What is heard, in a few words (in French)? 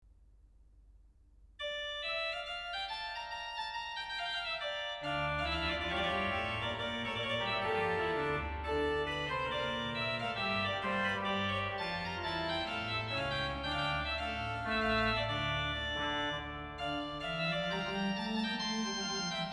duo, orgue; Louis Marchand – Premier Livre d'Orgue